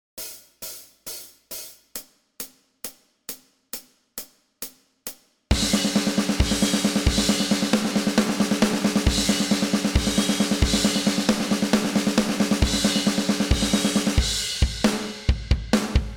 135bpm, bass

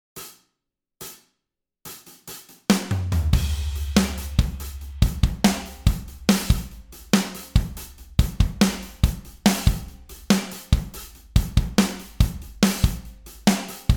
Fifteen Eight, bass

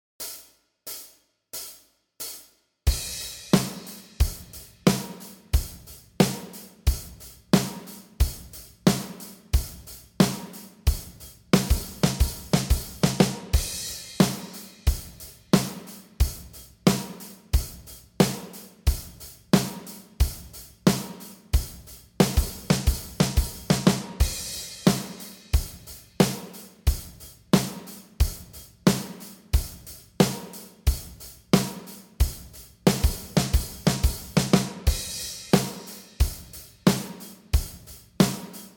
90bpm, bass

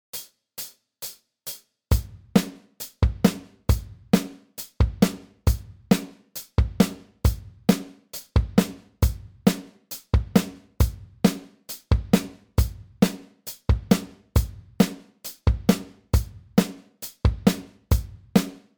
kit

